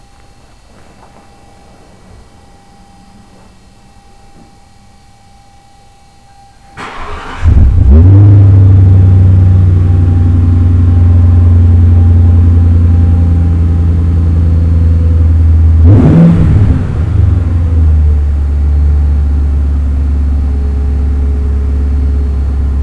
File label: exhaust